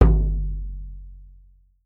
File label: African, African set percussion; Voice Crystal - Steve Reid Definitive Percussion